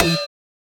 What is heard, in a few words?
Percussions